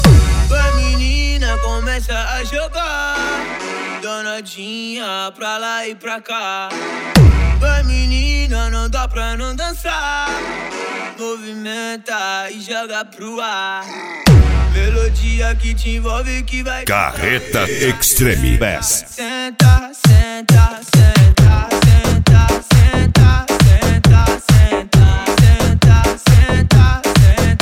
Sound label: extreme bass; carreta